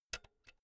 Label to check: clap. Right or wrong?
Wrong